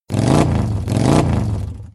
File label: vroom vroom